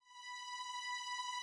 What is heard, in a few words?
estrings b6; PC Gamer IT CD 40; SMACDEMO